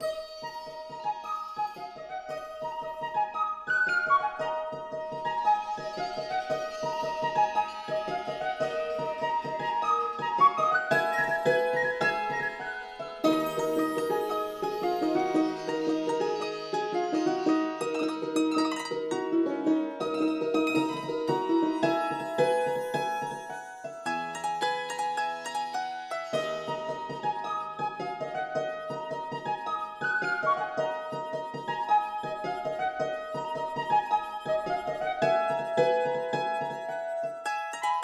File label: Phrygian